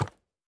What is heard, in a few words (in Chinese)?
山羊脚步声